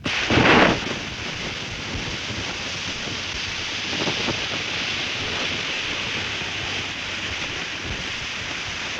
Fire Bomb Lights And Hisses Fuse

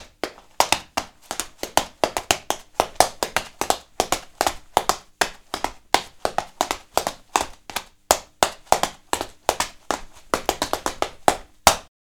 Tap Dance

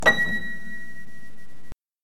Piano

